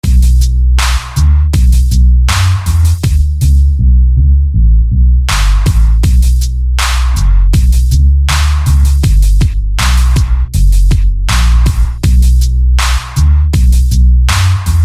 Bass Deep; SFB FX

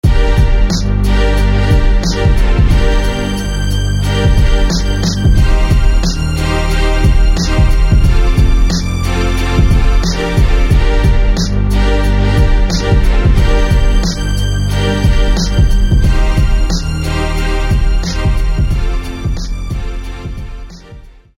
Fire Strings

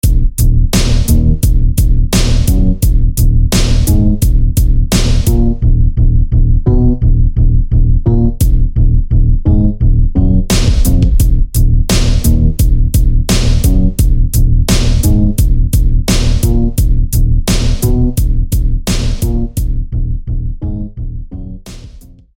Bangin Bass; SFB FX

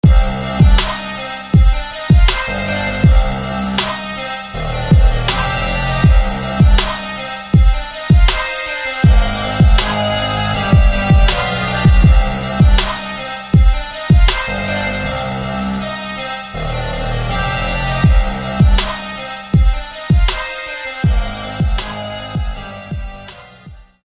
Brass Fire

